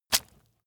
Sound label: leather impact mud soft squelch